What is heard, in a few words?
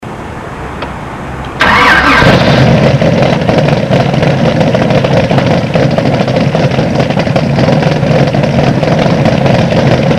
Kit Car Idle